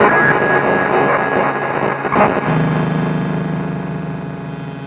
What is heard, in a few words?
Broken Radio; Sonic Foundry (Sony Creative Software); Loop Noir